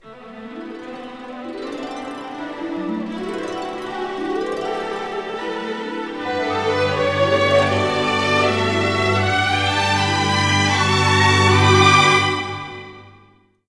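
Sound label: 80, orchestra; East West; Scoring Tools; Let's Fly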